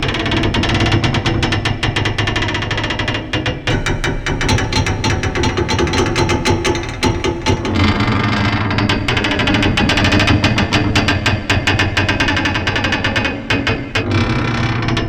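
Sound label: metal pipe stress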